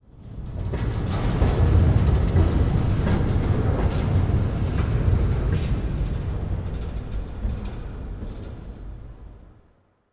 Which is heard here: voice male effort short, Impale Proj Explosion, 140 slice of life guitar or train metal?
train metal